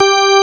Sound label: organ